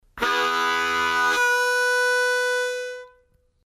4 Draw Slap Ta Slow, harmonica

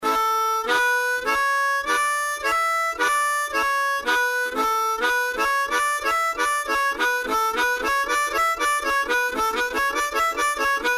Slap Melody Speeding, harmonica

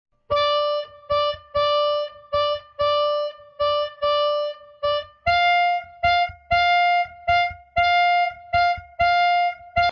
Side Pull Chrom Slow, harmonica

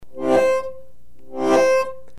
4 Blow Tongue Slap, harmonica

